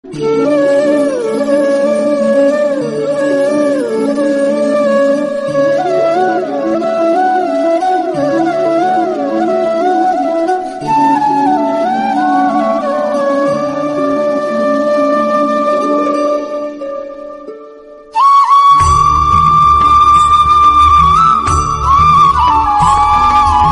flute instrumental; krishna; radhakrishna; hindi

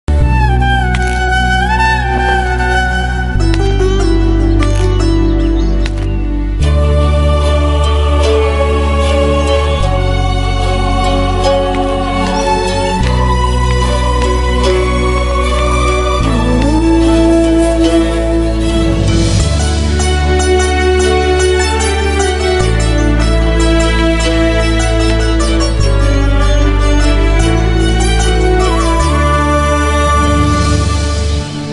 flute; tenali rama

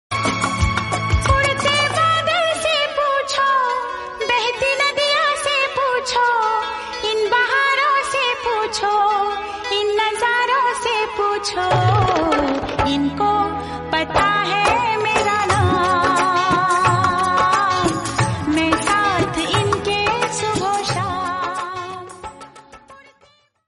hindi song; sangram

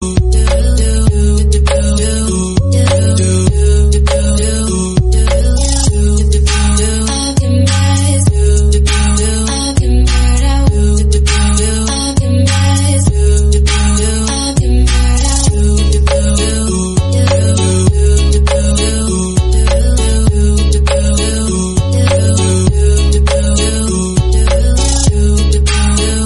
bass boosted remix

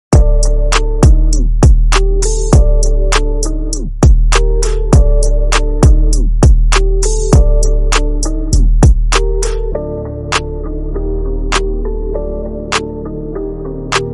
beast inside beat instrumental

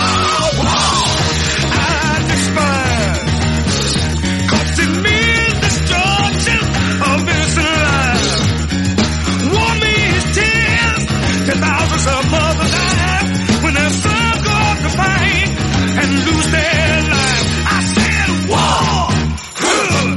english song

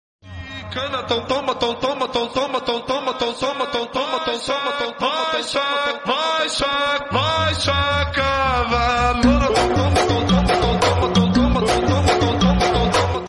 remix bgm

